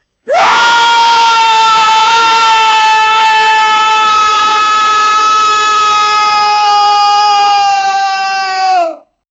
man screaming repeatedly

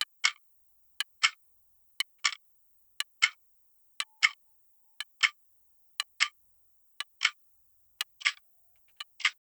cinematic sound design, time

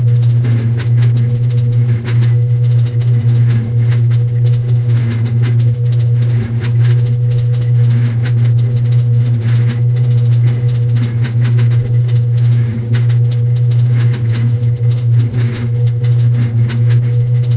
reel to reel tape machine; 1960s

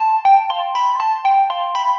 melody

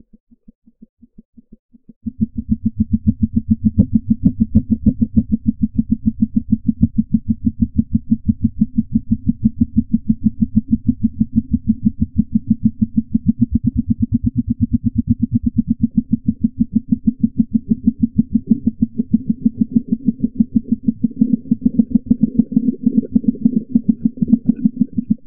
a wet squelching slither, creepy

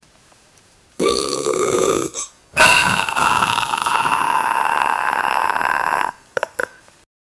loud and disgusting throa

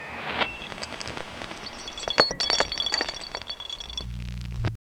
low creaking glass faint, broken

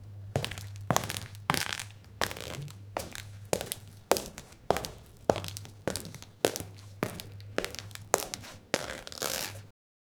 male leather dress shoes

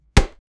sound effect of a playing card

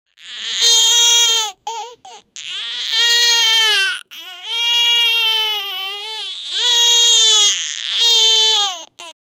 baby criying sound in lar, large room

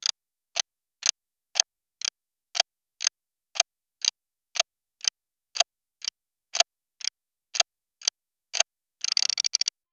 slow clicking mechanical, clockwork

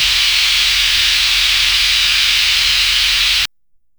drone spool laser tick ho

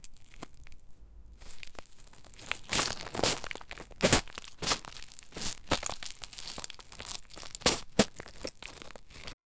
asmr style stepping and; textures

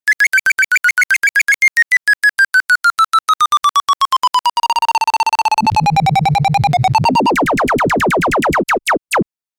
high energy cartoon chase, fast motion

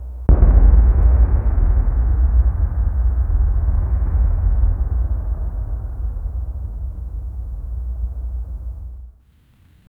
distant echoing explosion

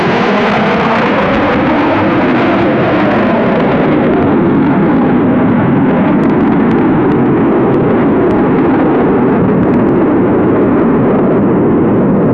the sound of a jet, explosive

